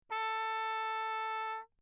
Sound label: TRP MUTE Lechner Bach; Perinétventiltrompete; Mutes